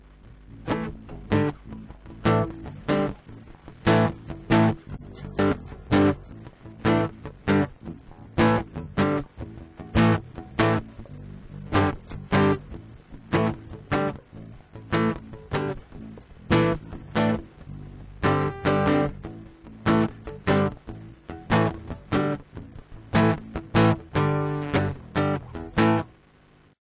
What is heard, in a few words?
bas gui